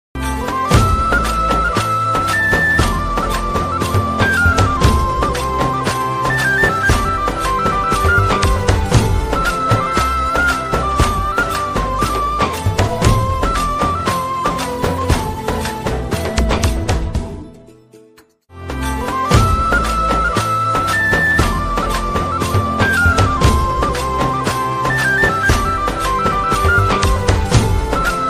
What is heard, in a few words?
bgm ringtone, tamil love bgm ringtone; guru